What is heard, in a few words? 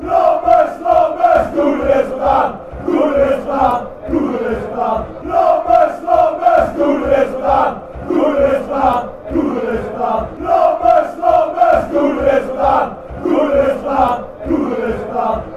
chant, fanchants; excelsior